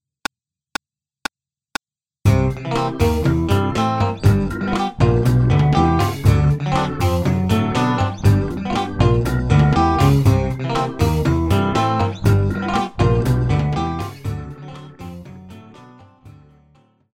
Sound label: rhumba, guitar